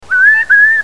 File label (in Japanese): hourokusigi